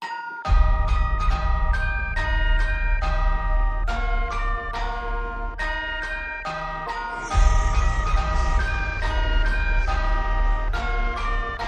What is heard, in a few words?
Wheels On The Bus Horror